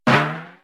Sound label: mining hammer; infinitefusion